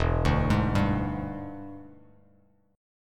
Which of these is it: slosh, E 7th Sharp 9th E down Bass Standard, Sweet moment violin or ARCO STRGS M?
E 7th Sharp 9th E down Bass Standard